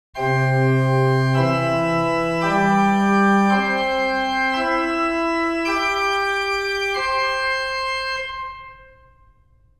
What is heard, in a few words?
organ